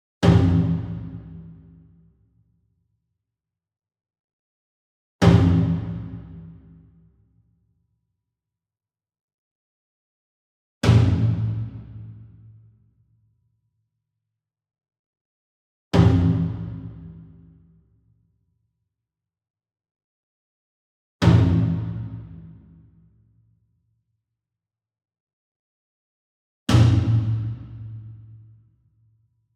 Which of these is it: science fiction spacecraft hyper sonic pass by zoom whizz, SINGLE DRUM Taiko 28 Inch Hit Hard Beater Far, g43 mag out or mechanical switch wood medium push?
SINGLE DRUM Taiko 28 Inch Hit Hard Beater Far